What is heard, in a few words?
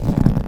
rubber tire strain; physics